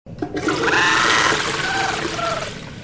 flushed

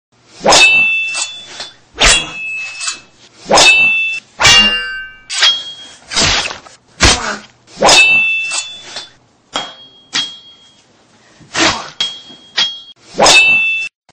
sword

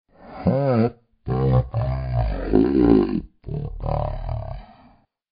hiphop; vapesquared

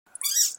yahaha

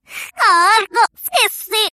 clock, reverse

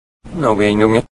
penguin yay; reverse

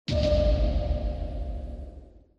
parried; cave

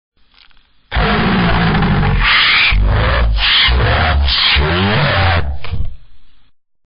sweep; vapesquared

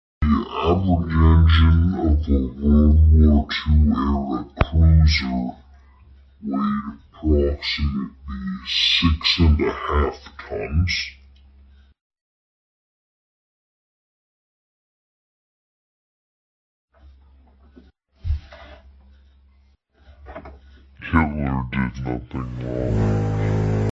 engine weight

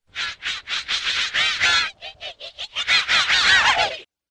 laugh, reverse

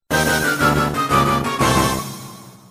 miss music; cave

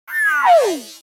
ooofff

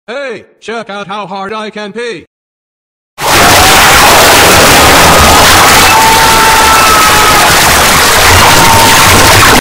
pee

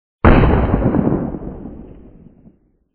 gun; vapesquared

